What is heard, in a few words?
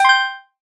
kill